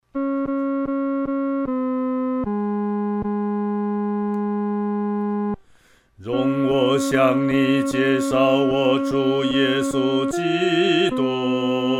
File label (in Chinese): 独唱第二声